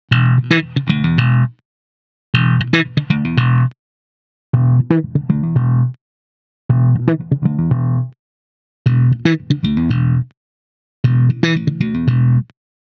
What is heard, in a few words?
slap